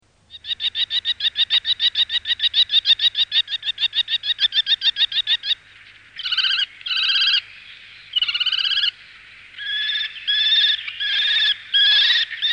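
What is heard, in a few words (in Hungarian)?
Vörös vércse; Madarak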